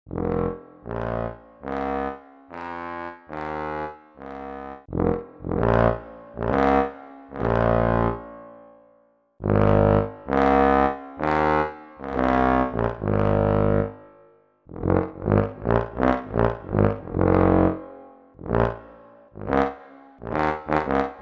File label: Bass trombone